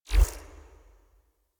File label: UI Default Theme Grid Open Two; Warframe